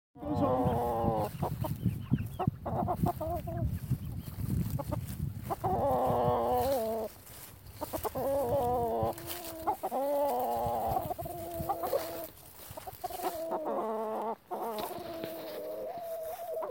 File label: boys grumbling not wanting to go home